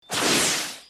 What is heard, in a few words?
jump; weapons